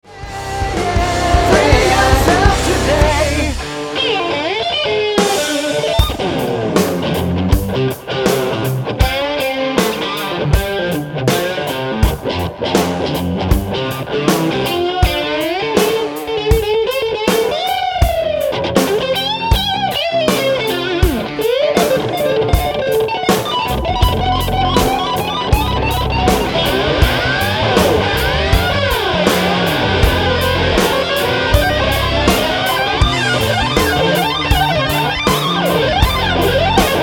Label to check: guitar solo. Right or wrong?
Right